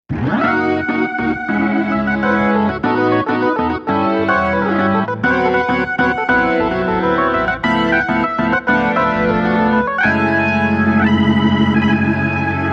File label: rock organ; juno-gi